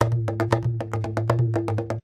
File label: congas